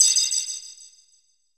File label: CRYSTAL, SCI FI; AKAI Anime Sound FX